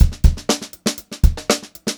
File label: GROOVY BEAT, Funk Groove Drumloops; USB Soundscan vol.38; AKAI